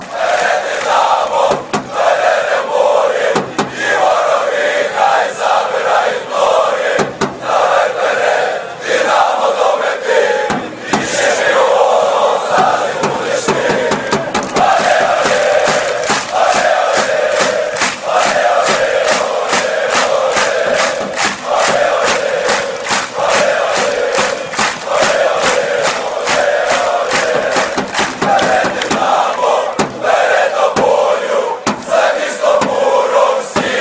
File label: kyiv fanchants